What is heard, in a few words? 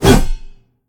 sm swing; smite; gilgamesh